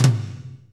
tom, fast shuffle, Roland; Northstar; Drumscapes Roland; Toms

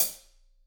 HH, Drums; Bob Clearmountain Drums I

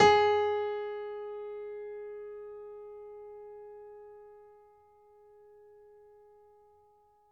piano; East West; The Ultimate Piano Collection

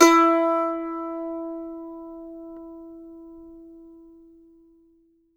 MANDO NOTES, E4; Sampleheads; Whole Lotta Country 1 & 2

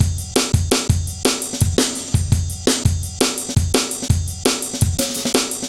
heavy live ride, drum loops

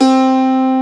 IRISH HARP C3; Proteus3

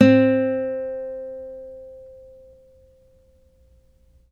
ACOUSTIC; guitar; East West; Steve Stevens Guitar Samples Collection; ACOUSTIC FNG

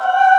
VOICY; E-MU Producer Series Vol. 5; 3-D Audio Collection; 3D Multis; 3D Sprint Select 2

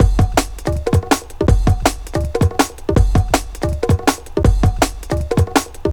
Loops, breaks; M8

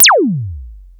POLYMOD; AMG - Vince Clarke Lucky Bastard

